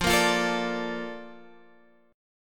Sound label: A sharp Major 7th F